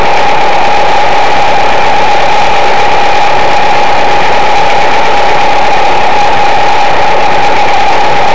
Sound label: before drive, fiat barchetta